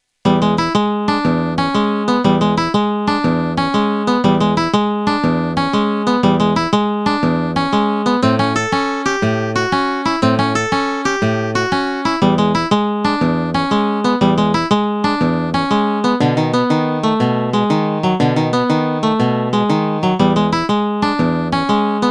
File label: Rockabilly Blues